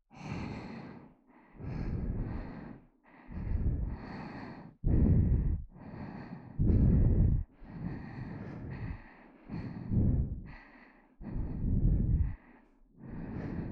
吐息, ASMR

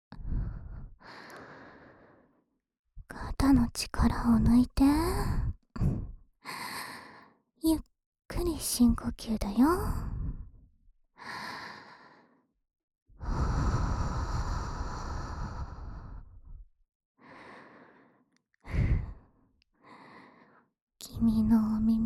ぐっぽり深～い耳舐め 右耳, ASMR; 陽乃; ねっとりとろとろW耳舐めリラクゼーションASMR 二人の恋人から贈られる、あなた専用搾精コース

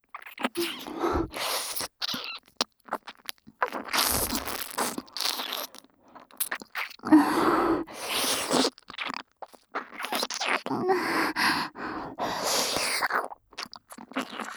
ねっとり深耳舐め（右）手コキループトラック, ASMR; スクールカースト上位の生意気JKを催眠分からせ調教～彼氏大好きヒナちゃん♪大事な処女をスマホ催眠で敗北献上→求愛メロメロおま◯こで中出し懇願アヘ絶頂♪～

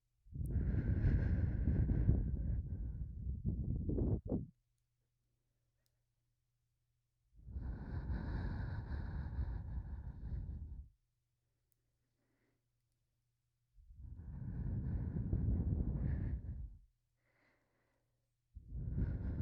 耳ふー 吐息 × 耳舐め, ASMR

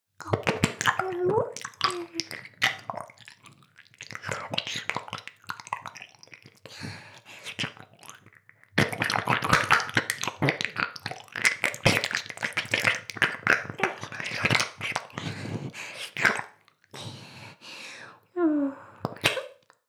耳舐め LR逆ver, ASMR; 全妹計画２; メスガキ妹; 全妹計画<オール・シスターズ> 2妹め

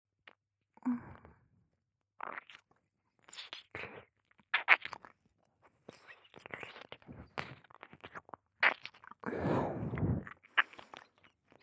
右 耳舐め, ASMR; 癒やしメイドと低音クール女執事の超ドスケベ変態ご奉仕 ～筆おろしからひたすら濃厚溺愛エッチ!～

